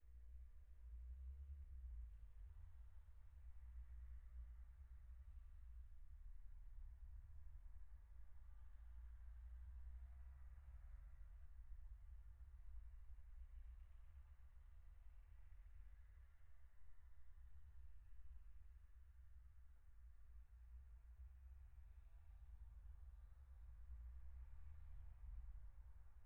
street noise, Street Noise Isolation Audio; active noise cancellation (ANC); Background audio samples used are from ETSI

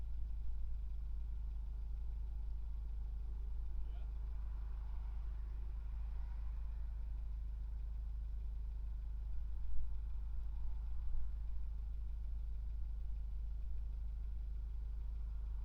street

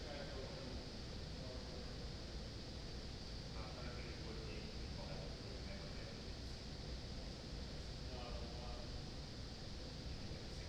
office a c

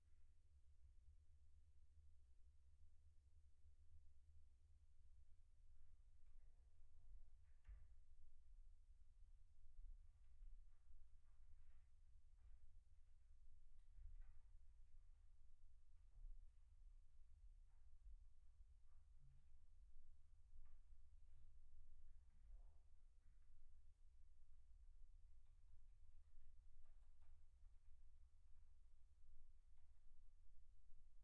office noise, Office Noise Isolation Audio; Apple AirPods Max; active noise cancellation (ANC)

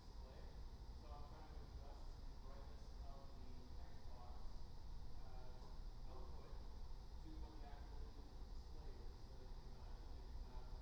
office

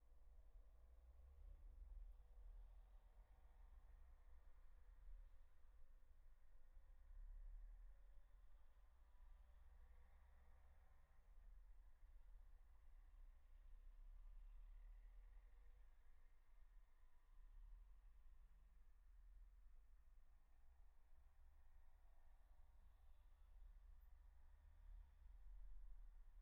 street noise; sony wh-1000xm6; Sony's WH-1000XM lineup

